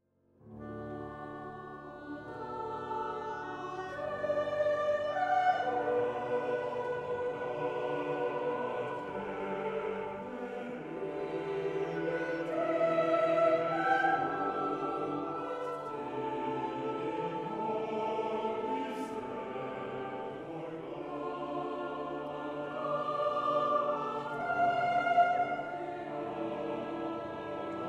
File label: timpano choro cordis organo; Stravinsky